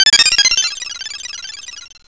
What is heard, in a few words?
error